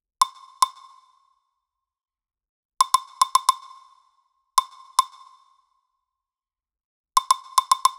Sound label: Nu Disco Kit 110bpm Percussion; EDM Drums; Drum Kits; Stems; Ghosthack; Ultimate EDM Drums; Nu Disco Kit 09